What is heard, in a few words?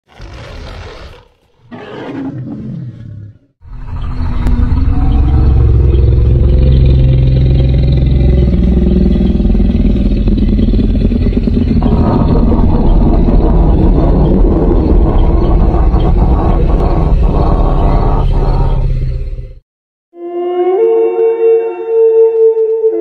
Spinosaurus, غرش